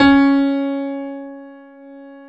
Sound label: PIA PRO, Roland